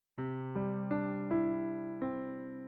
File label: piano; 88pro